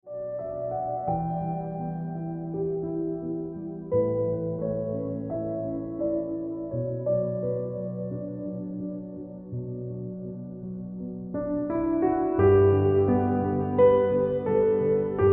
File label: Ringtone Piano Music